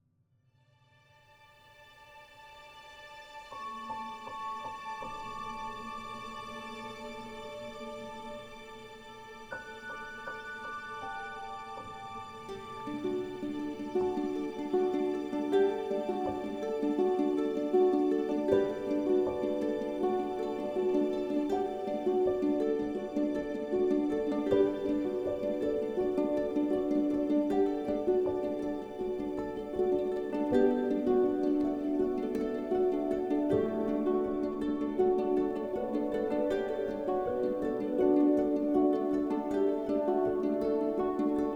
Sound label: Love theme light hearted ukes in D